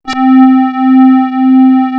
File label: SQR LEAD C3, PPG, analog; USB Soundscan vol.49; Analog Fantasies; AKAI